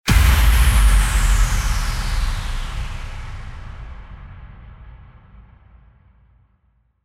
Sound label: IMPACT